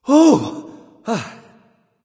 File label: nv sigh; smite; voicepack; xbalanque